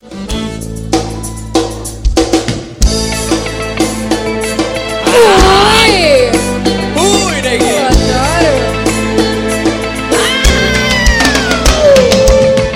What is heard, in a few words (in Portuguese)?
toque do celular